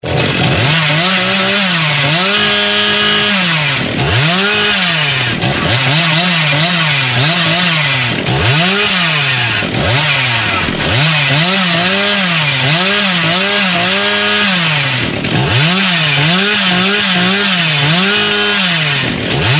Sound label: Chainsaw